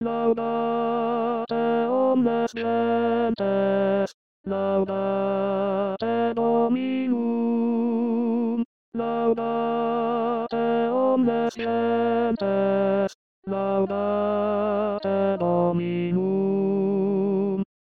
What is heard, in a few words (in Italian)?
Tenori; Laudate Omnes Gentes